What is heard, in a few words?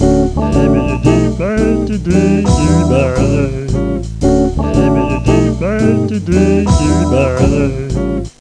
jazz